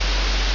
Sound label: ambience room tone